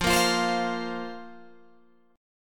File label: F Suspended 4th F, Guitar Open G